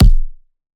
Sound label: Punching Percussion, Straight Hand, processed; 99Sounds